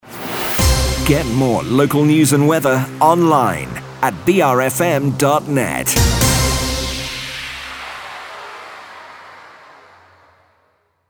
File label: News OUT STAB